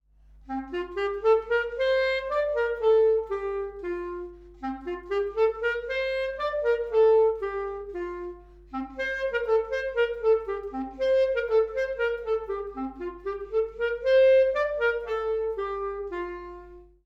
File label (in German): Sound Klarinette; blaeserjugend; musikmentoren